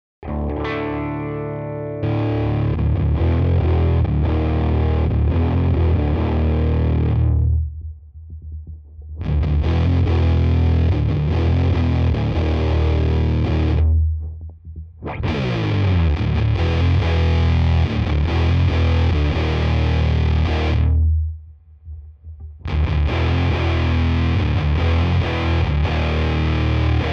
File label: MOON FUZZ all 12 Uhr Filter Flat; min91215max